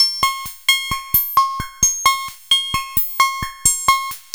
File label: FM BEAT, FM LOOPS; Zero-G Ambient 1